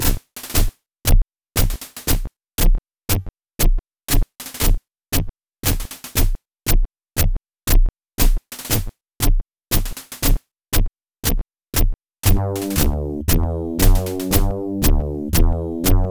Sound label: Victory March; Wolfenstein 3D PC 1992